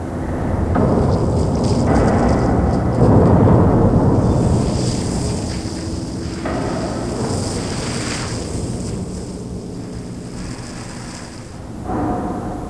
inferno; cypher

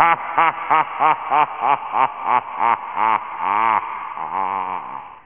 Hit ghost; Maniac lite v2.1.1

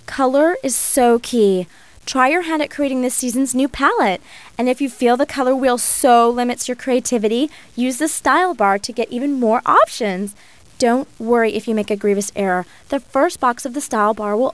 FUNK

